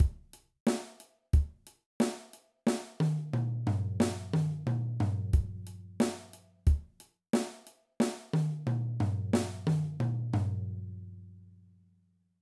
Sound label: Basic Fill